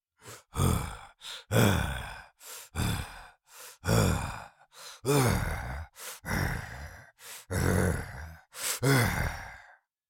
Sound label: Cartoon lion voice breathing